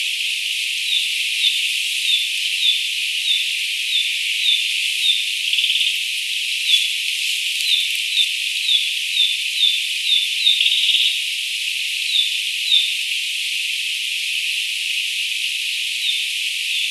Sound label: Ficedula narcissina